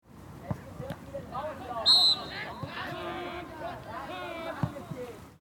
Fussball Jungendtraining Spielszene

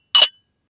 Espressotasse

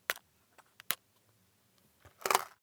Lutschbonbon

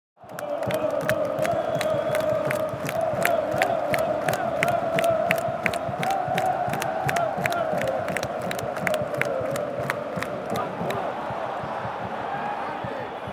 Stadion Fan und klatschen

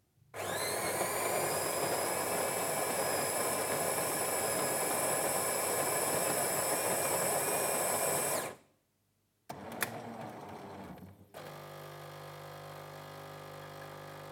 Espressormaschine reinigen

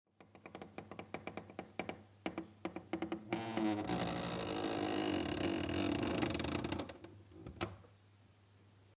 Schrank quietscht

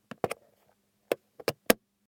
Quietschen Fahrradreifen

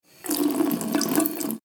Bad Wasser an

